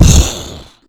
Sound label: boss explosion; Cuphead